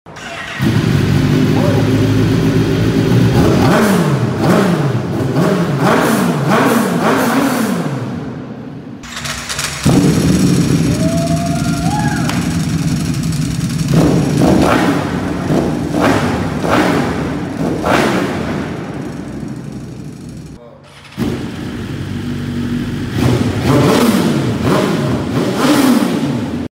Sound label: cbr 100; موتور